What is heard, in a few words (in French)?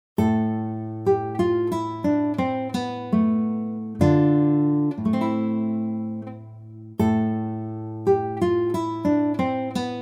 guitare; etude andantino